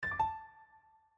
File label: Unlock, phone ui